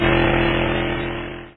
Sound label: Motorbike loud GO